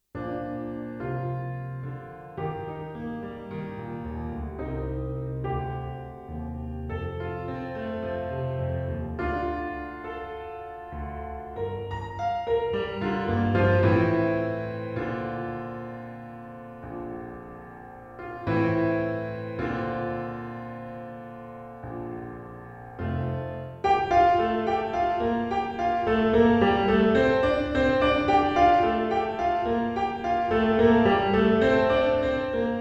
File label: piano pop